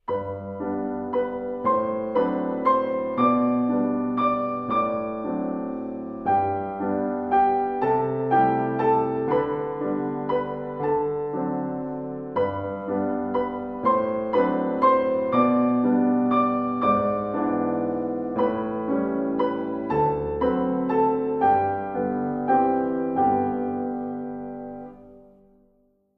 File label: Duet